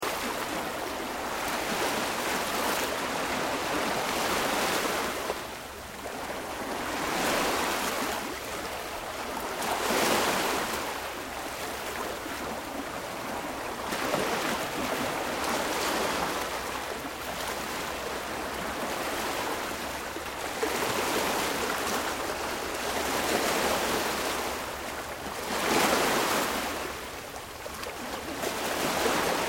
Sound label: Water Ocean Small Waves On Rocks; Elemental weather effects; Nature